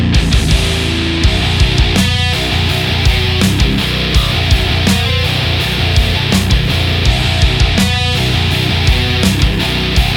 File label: good as nu heavy loop